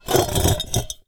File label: Drag, Vase